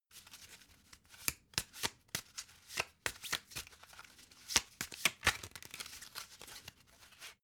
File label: playing cards shuffle slow